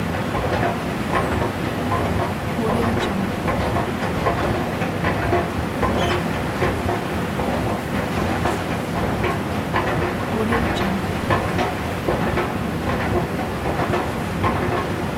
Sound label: escalator in a mall